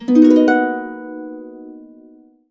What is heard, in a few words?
harp chords, B maj7; USB Soundscan vol.62; Complete Harps; AKAI